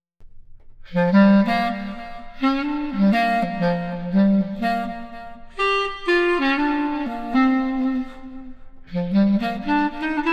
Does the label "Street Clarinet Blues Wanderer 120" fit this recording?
Yes